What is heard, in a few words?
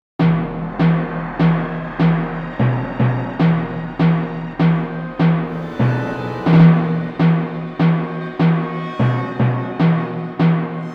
Rhythm